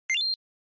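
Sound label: Game Boy General Pitch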